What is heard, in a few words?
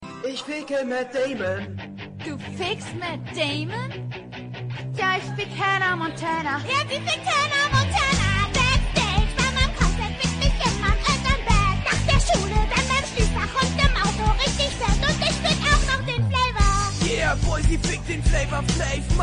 german song ringtone